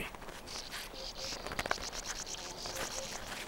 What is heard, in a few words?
digging